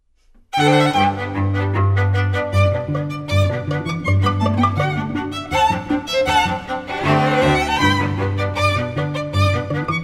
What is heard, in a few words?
Polka pizz